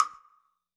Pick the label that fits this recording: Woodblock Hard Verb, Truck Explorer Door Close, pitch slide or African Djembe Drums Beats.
Woodblock Hard Verb